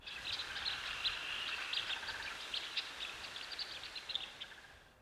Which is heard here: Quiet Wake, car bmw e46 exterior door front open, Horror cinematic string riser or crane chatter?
crane chatter